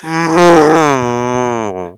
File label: cow pain; svencoop; cow tycoon; gonome replace